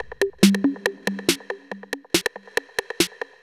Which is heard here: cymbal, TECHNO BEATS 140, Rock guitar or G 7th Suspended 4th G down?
TECHNO BEATS 140